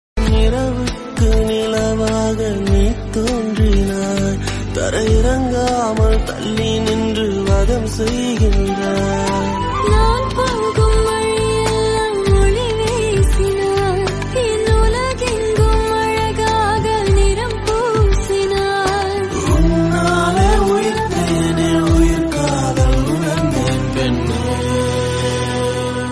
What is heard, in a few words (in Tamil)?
Tamil whatsapp status